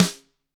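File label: GRETSCH snare rim; ILIO; Double Platinum Drums 2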